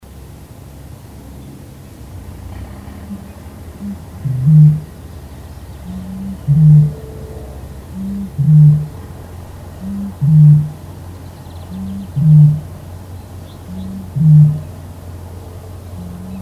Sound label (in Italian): Tarabuso